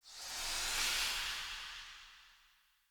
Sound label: Player Short Sword Throw Wind Up; Weapons; Swords